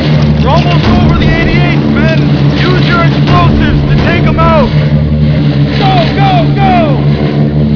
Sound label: inside airplane